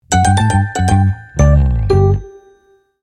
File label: soundlogo